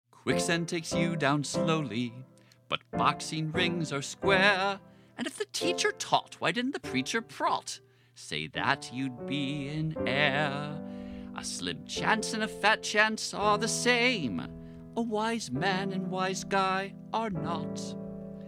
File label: voc piano; Mother English